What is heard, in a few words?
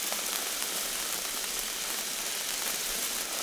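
plasma projectile, plasma grenade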